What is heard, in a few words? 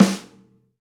Rock Snare, Roland BK-5; Snares